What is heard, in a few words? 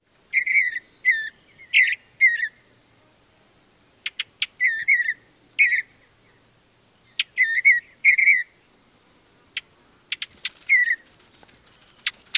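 Mountain Bluebird, song and chik calls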